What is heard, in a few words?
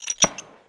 TORCH COCK, RELOAD; WEAPON